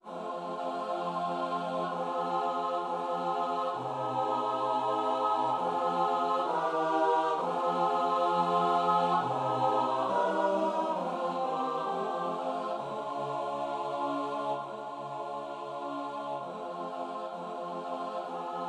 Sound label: regina coeli playback; regina coeli laetare